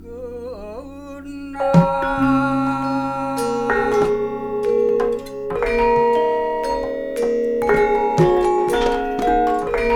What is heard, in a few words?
GMLN, Gamelan Orch; Roland - LA Composer vol. 1